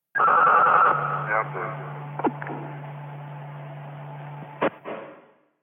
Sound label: police; thelastnight